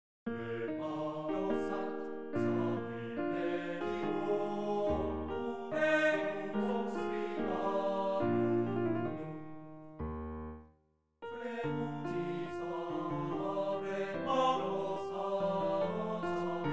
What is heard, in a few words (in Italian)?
Lied per voce e pianoforte; David und Goliath